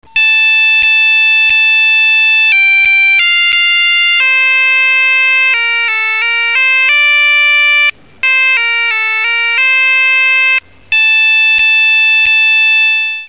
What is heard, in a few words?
siemens, Polyfonisk musik, Hymnen; midi; kroatien